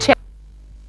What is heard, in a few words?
Fx Vox; Equipped Music; Loungin House